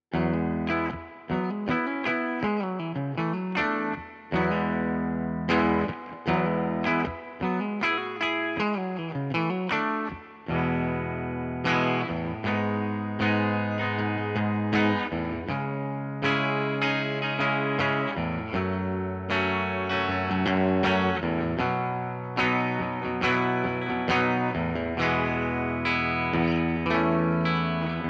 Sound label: Bridge Clean, electric guitars; Gusta GTL05; gtl models